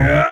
jump

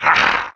Werewolf Transform Slide

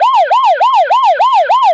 Ambulance; Doctor Bone Cookie; cookie run

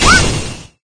Throwing Javelin; Jungle Warrior

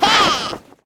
slide; cookie run

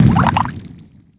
Transform End; Soda

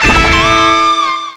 Note Spreads Perfect; RockStar

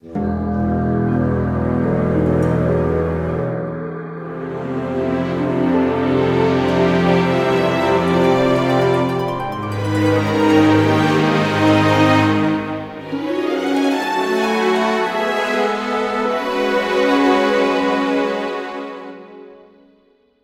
Gacha theme wind archer

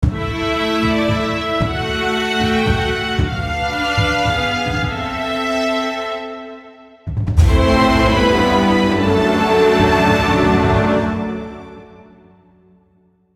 Gacha theme crunchy chip

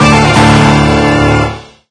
Jingle; cookierunwiki

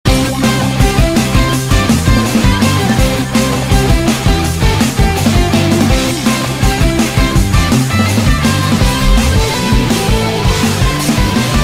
Bgm Bonus Night; Cookie Run; rockstar

